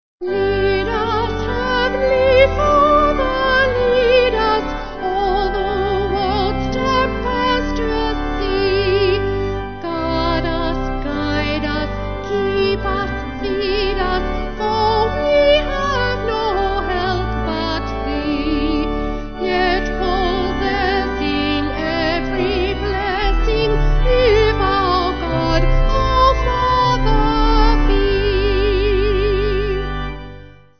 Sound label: Vocal Organ; D Carmen